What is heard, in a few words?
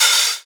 Open Hihats, Cymbals; Vengeance Samples